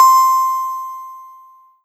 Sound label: M-Audio Venom DreamBells C6